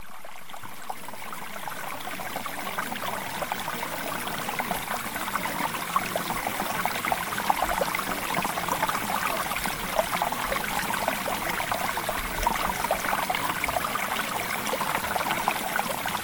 Mountain Stream

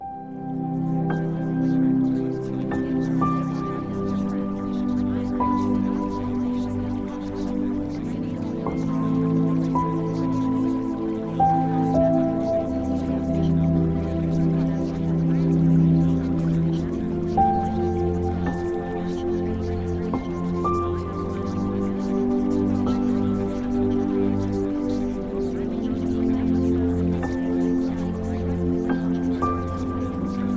Music

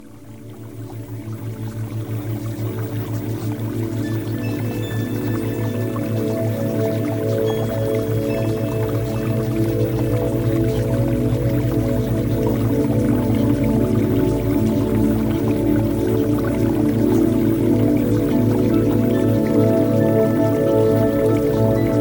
Music Theta Waves; Premium Subliminals

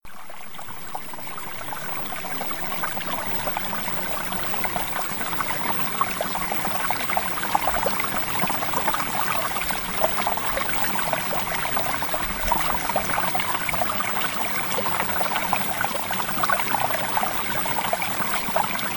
Water

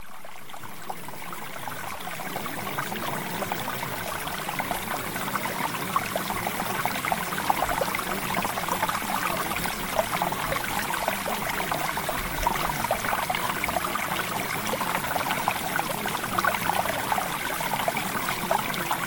Water; Premium Subliminals

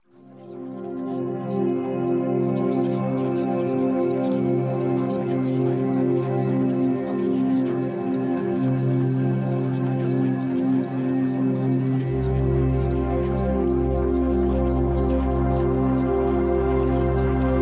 Music

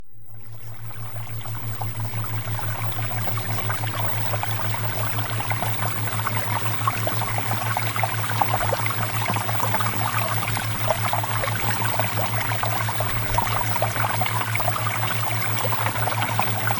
Water Theta Waves

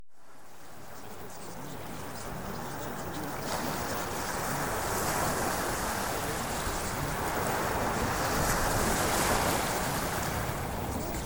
Ocean Waves

